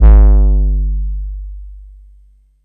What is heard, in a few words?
Kick 808 Hard